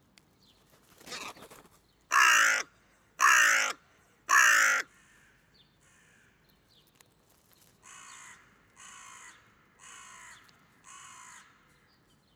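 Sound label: hooded crow corvus cornix cornix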